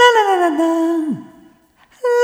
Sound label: Rhythmic A